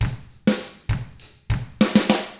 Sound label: ROCK, Drums; ILIO; Double Platinum Drums 1